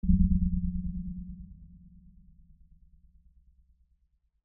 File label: ping